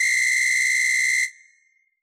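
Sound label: samba whistle low; AnlgKit1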